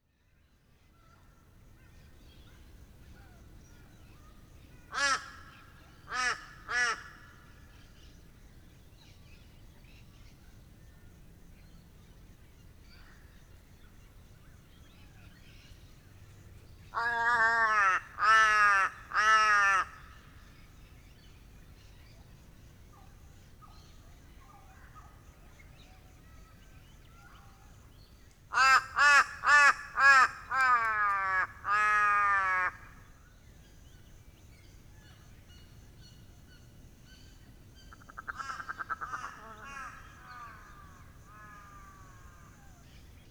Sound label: crow corbeau